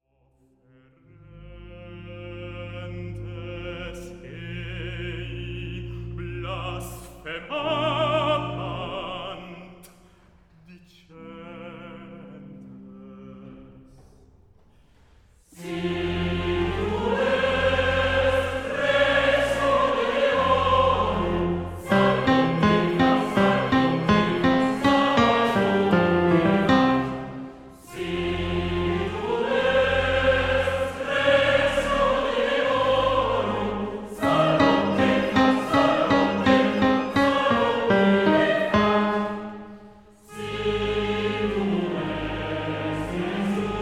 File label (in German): Tenor; César Franck; Parole